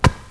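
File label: body bump; Total Chaos Nukem 3d Pack; duke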